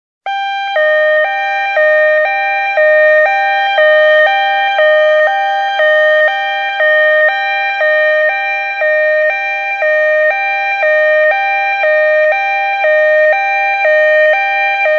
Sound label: EUROPEAN SIREN SOUND EFFECT